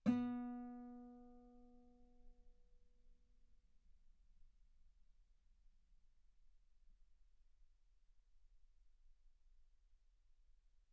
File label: Guitar B3